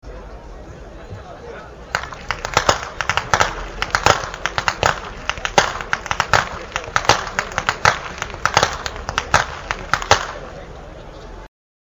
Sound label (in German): schnalzen, jugend